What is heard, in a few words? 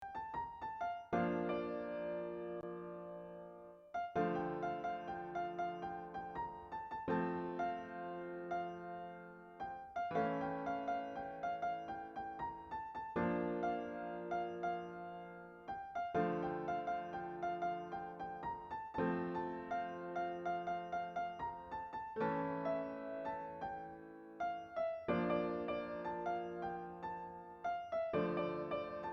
Piano